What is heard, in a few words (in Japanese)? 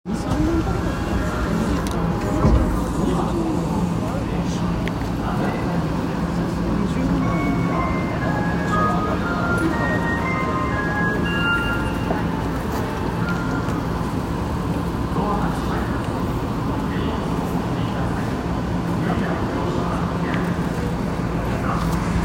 銀座線溜池山王東, train sounds